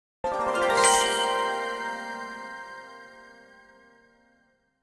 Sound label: Quest Completed; B Path of the Teal Lotus